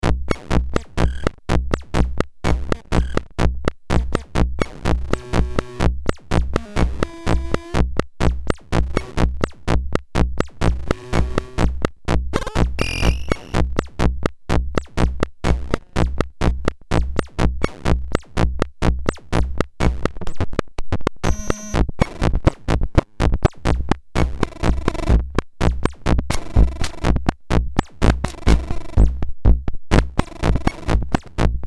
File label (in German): Drum Sequenz C; Landscape Noon